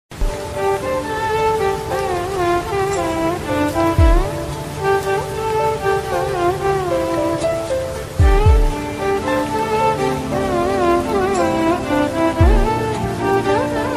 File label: melody ringtone with rain feeling bgm